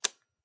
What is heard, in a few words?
Landing Switch; Pajamas; smite; kumbhakarna